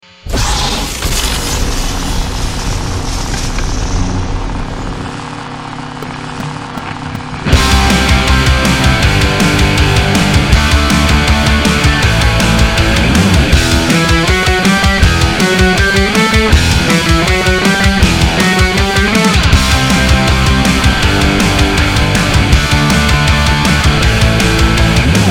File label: Power Chord Drill